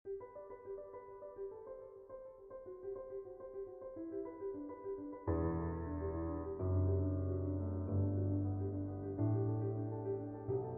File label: Invention in G Major